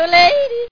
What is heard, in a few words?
yodel; human; Wired for Sound Pro CD; Aristosoft, Inc.